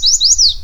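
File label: song yellow warbler yellowknife end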